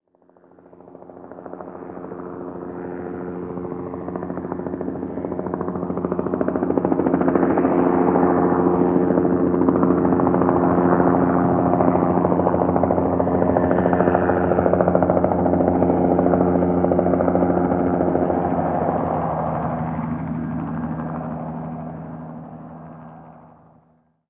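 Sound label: helicopter flyover stereo; amb elements; modern warfare 2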